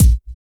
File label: Kick, Kickdrum; Equipped Music; Loungin House